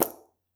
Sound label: plop, bubbles